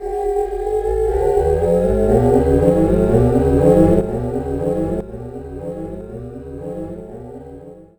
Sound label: Machine Choir; Sonic Foundry (Sony Creative Software); Loop Noir